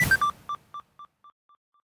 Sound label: cancel bid; Original TK Sounds